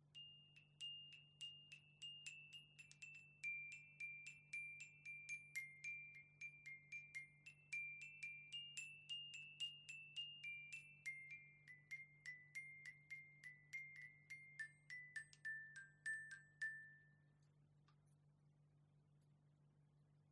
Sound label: glockenspiel